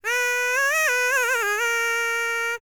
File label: BULGARIAN, World Voices, BALKAN